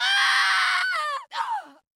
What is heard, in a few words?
Long Scream